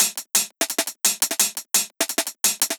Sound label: Top Tone, Loops, breaks; M8